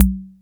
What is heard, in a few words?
KORG SR120; Drums